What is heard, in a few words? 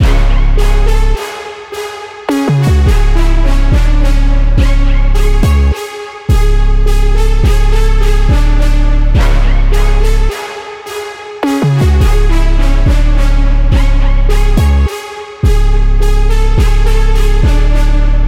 105 BPM C# Min, Brass; Cymatics; Monarch Melodies; Go To War